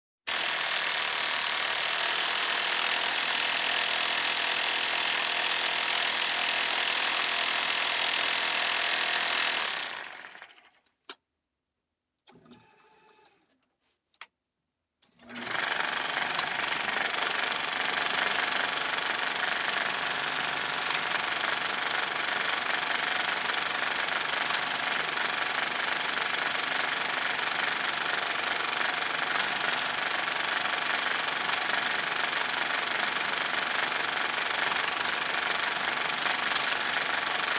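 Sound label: moviola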